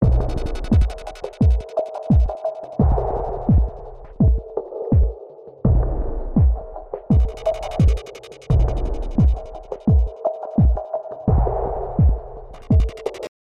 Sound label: Escort Ambient Loop